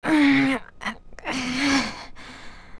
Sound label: Young Link Hot